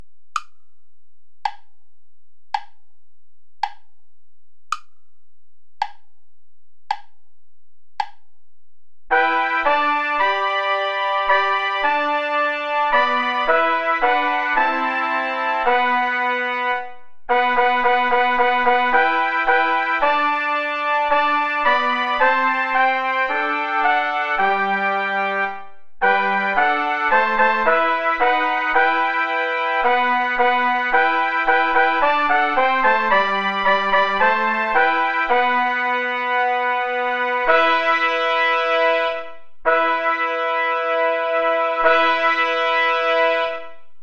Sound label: play along without trumpet; schutz heinrich; aller augen warten auf dich herre